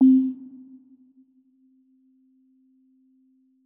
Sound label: bell; bespoke - Floral v.1